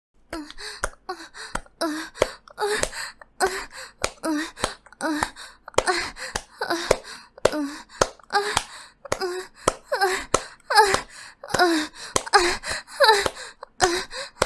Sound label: Clap